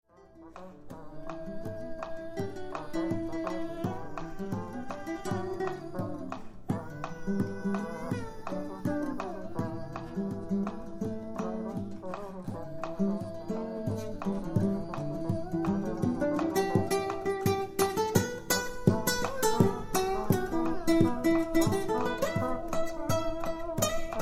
Trio Impro

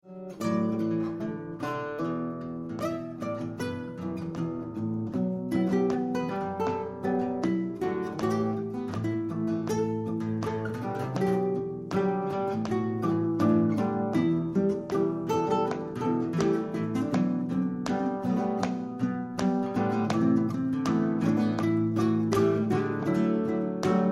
Trio Impro

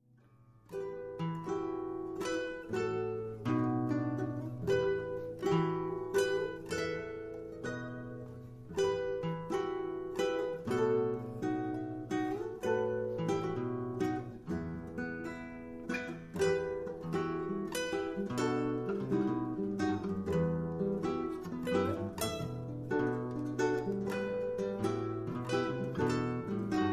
Trio Impro

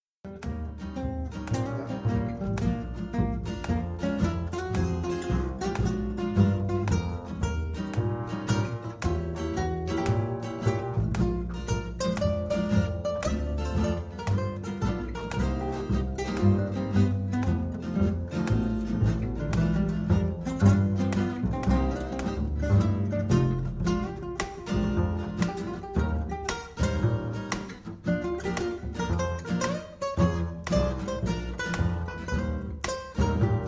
Trio Impro

